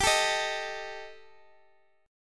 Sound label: G Suspended 2nd Sharp 5th, Guitar Open D